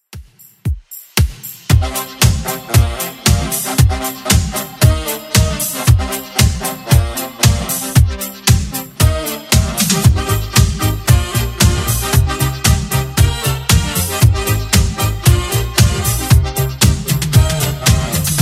80s Redrum 115bpm

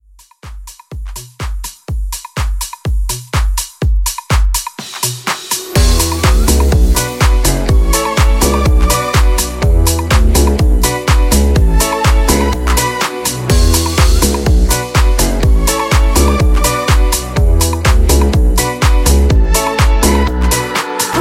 Tech Deep House Intro

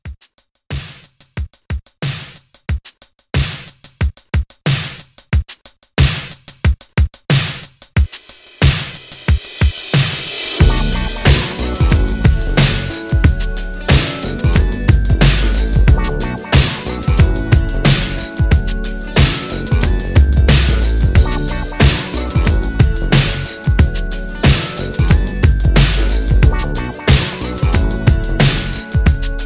90s Mixshow 91

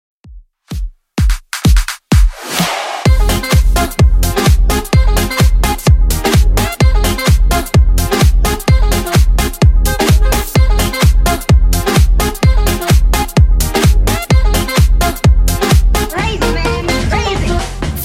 128 bpm

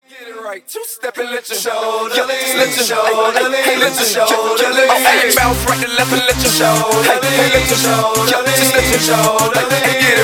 Aca In Throwback 97 BPM

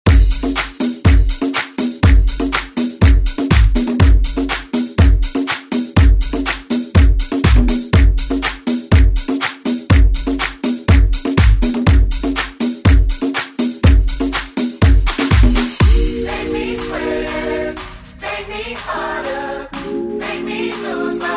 Hit Coollie Edit; Deejay Hit

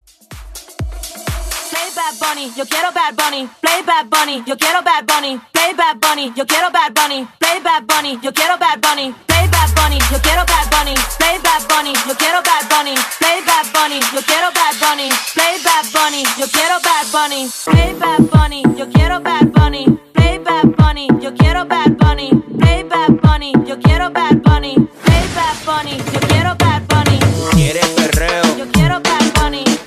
Aca Clap Party Transition 125 98 BPM

